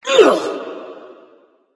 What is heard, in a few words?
grunt hurt; smite; thanatos